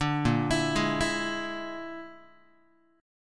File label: Bb 7th Flat 5th Bb, Guitar; Bb7b5; arpeggiated; JGuitar